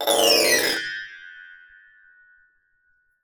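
Piano Metal Mallet Gliss Very High Descending Legato; InspectorJ; Piano Mallet Strikes and FX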